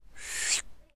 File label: drink